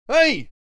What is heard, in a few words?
Male shout for attention; scream